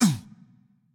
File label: greek hit react small; npc; smite; roman archer